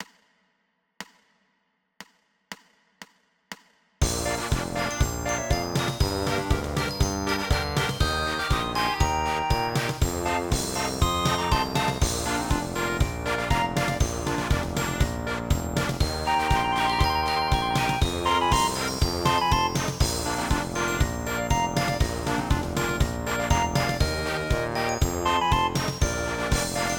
Bb Marching band, cornet

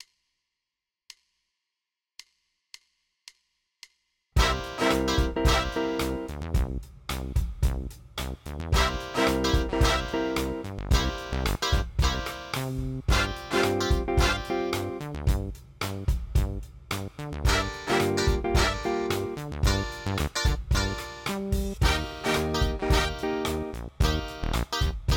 Bb warmup, cornet

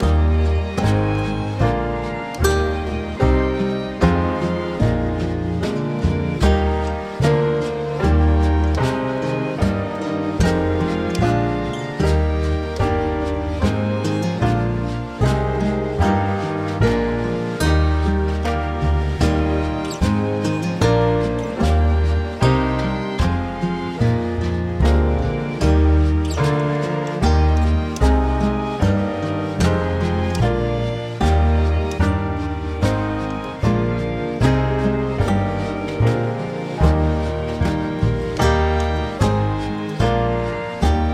Bb F Major Scale; brass

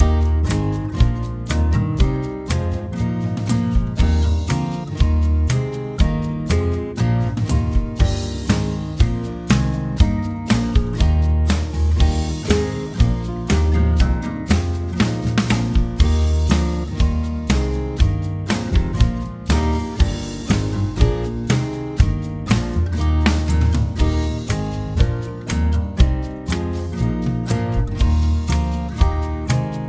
Eb C Major Scale, brass

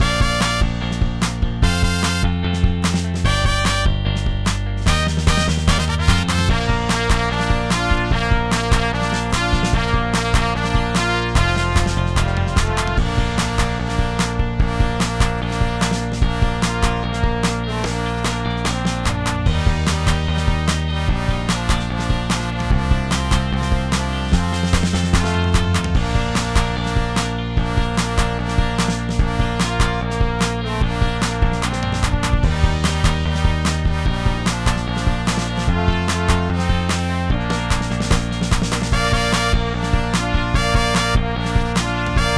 Bb Jingle Bells, brass

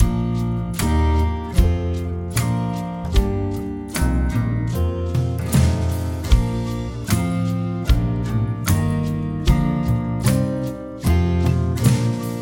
Bb C Major Scale; brass

